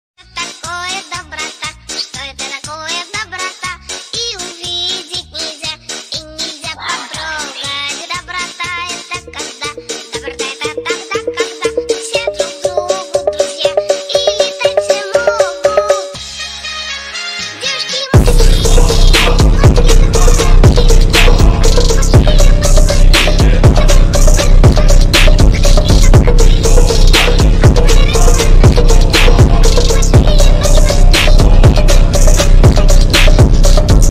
phonk remix

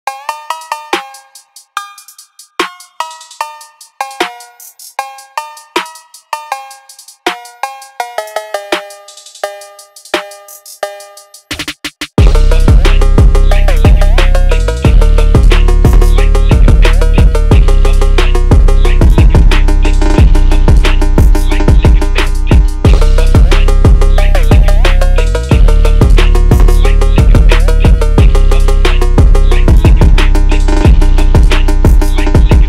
phonk remix

